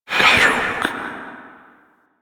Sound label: Fire Whispers; Warframe; Tenno; Grimoire